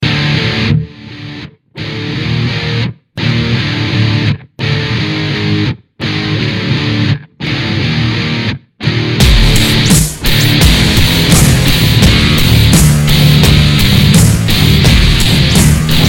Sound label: Metal Composition